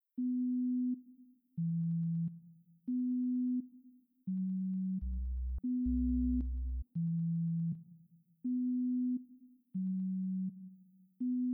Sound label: Sinewave